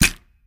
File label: Reward Egg Tap; angry birds